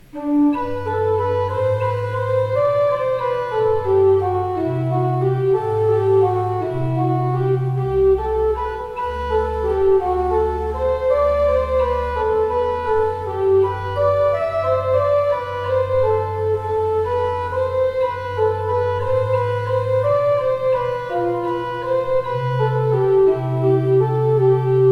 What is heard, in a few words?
pipeorgans